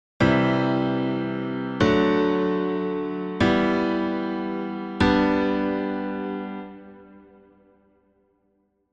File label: piano